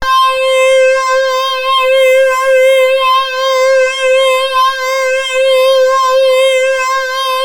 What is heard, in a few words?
Access Virus B; Synthworx